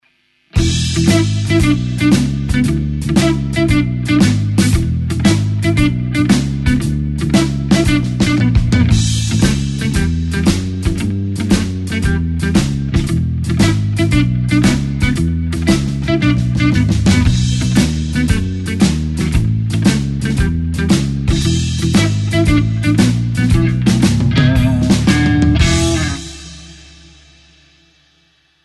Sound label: E shuffle bassline